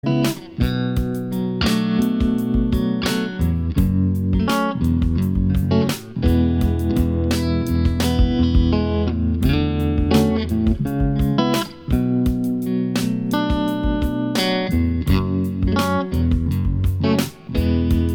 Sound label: Instrumental